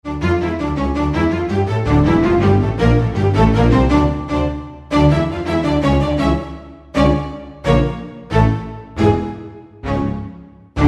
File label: Lower Marc, Roland SR-JV80-02 Orchestral